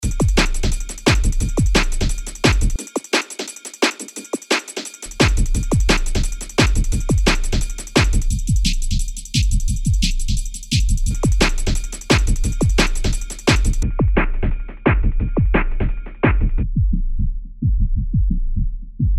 EQ three

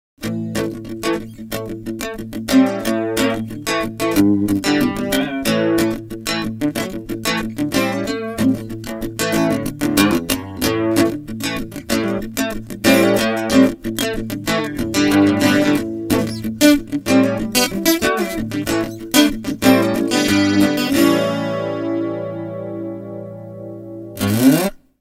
Blues

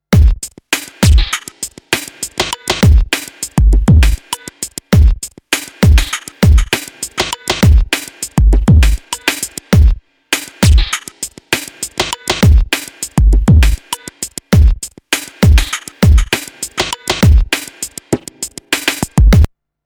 2 Step

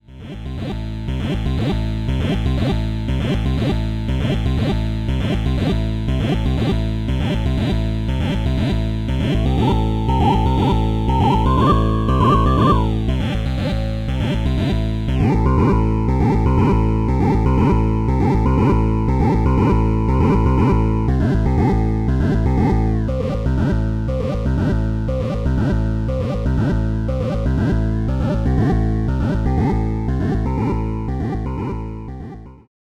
Rossum Electro Music Morpheus

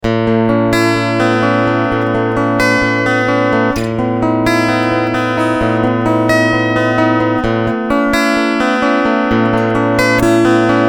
Mix Picking

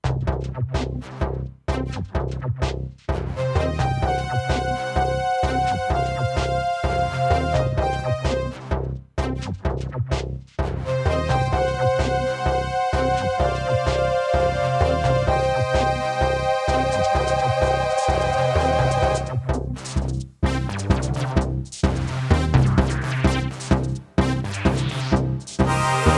Kreuzberg Funk

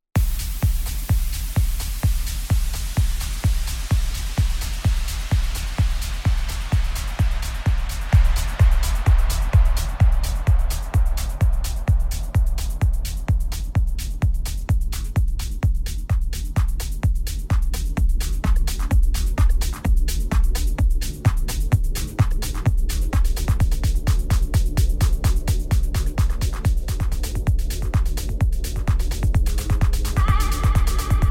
RMX 60 Delay

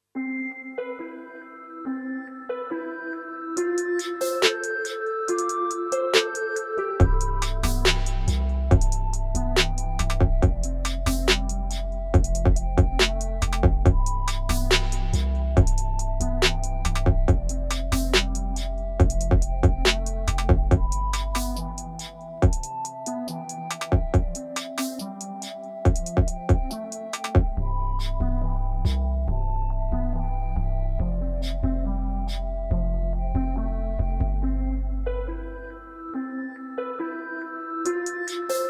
Novation Circuit Rhythm Demosong

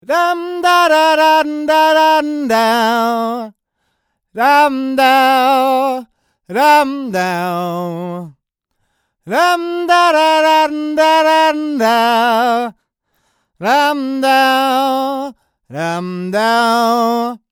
AKG C214 S2 near

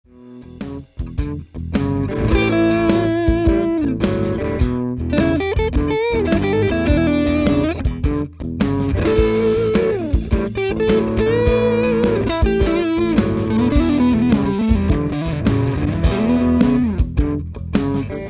Blues

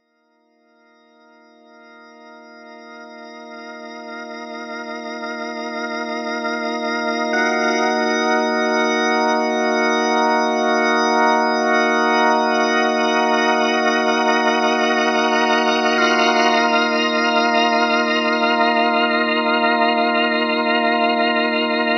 organ

